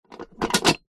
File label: foley box container industrial metal small lock sprung lock